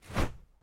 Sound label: Baseball Arm Cloth W; baseball fx & games